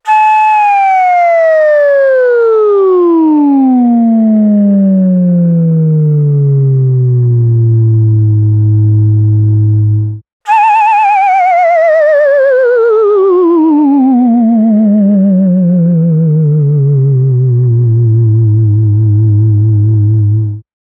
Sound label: Tinwhistle A3; Fantom57